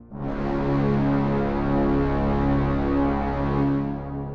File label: Dramatic Brass